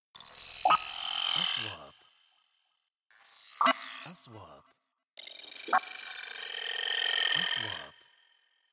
نغمة حركة الكترونية; transitions

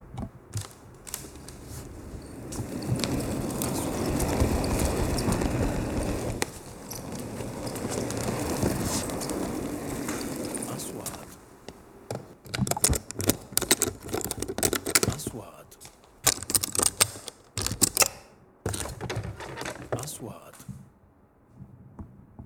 صوت صندوق معدات التصوير; sound effect; shooting

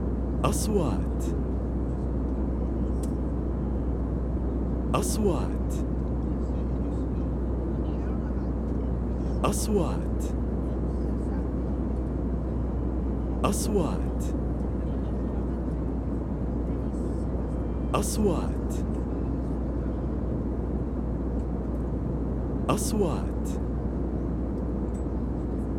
الصوت داخل رحلة الطائرة, airplane sky wind

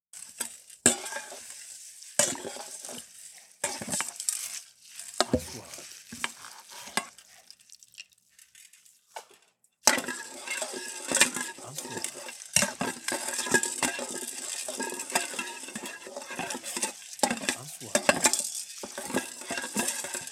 صوت طبخ خليط ملاس

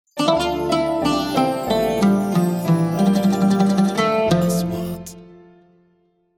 نغمة شعار; sound track

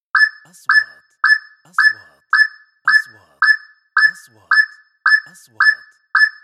نغمة تنبيه تنازلي; transitions

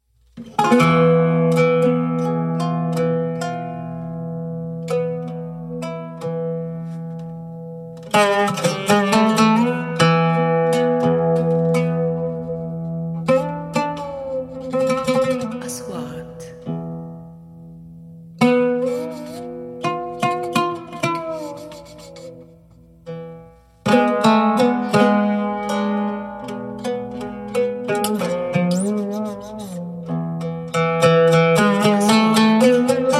تقاسيم عود; taqasim

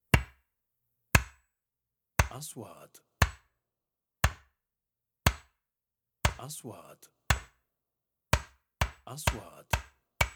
مطرقة حديد; sound effect

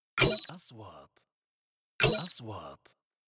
نغمة نقره الكترونية, transitions

